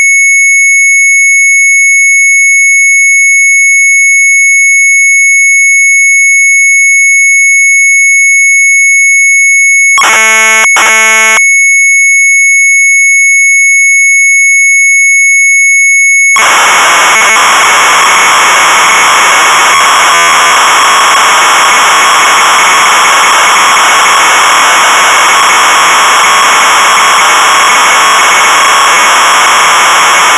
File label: MZ800 Tape; Sharp